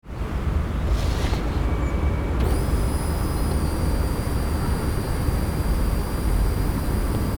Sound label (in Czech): zahadny zvuk